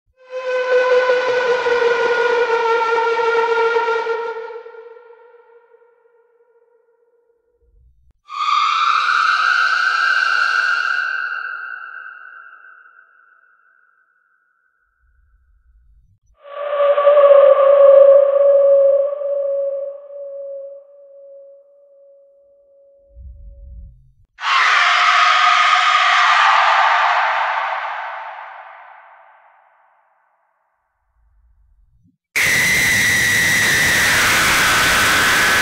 suoni terrificanti scary sounds effetti sonori horror bhoot sound suoni paurosi